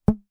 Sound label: foley rubber wrist band ping